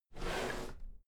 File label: drawer cabinet equipment open regular halfway